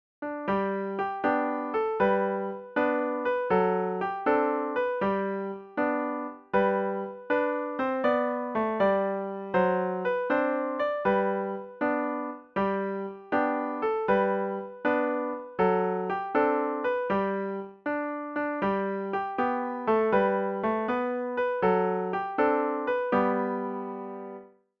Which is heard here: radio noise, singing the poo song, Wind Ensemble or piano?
piano